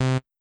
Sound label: Bass